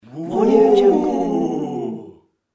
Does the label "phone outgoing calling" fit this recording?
No